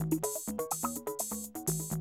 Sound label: 125, Classic Drum Loops